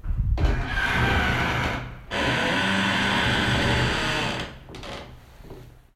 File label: Mil Door, Creaky Door, Milano; Infinity Room